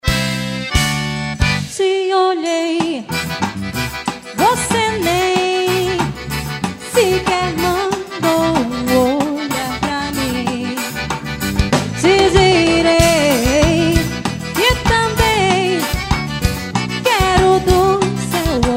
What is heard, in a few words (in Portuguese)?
acordeon